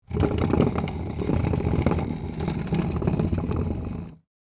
Dragging a rolling chair

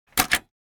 Cassette player tape insert and deck close

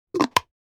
Skin care cream jar open

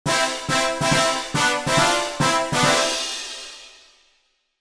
You win success victory fanfares; cartoon ascend

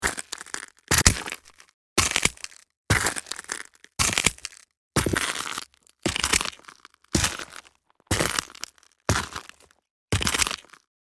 Footsteps on broken glass shoes walk; impacts; gfxsounds

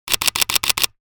Camera shutter clicks multiple shots

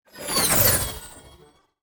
Futuristic whoosh transition

